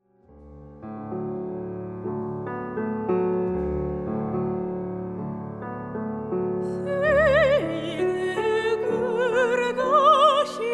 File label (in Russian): Vokal